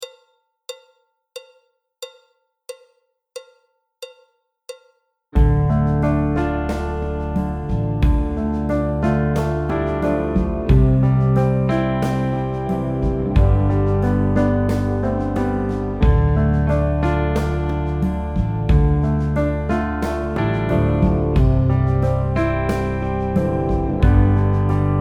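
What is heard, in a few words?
backing track, Jazz; alto, trombone, piano; Jazz Syllabus Level 1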